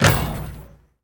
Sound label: Street Ball Dunk Chain Wood; SFX Kit